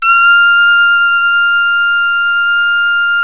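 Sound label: BRA TRUMPE, trumpet, brass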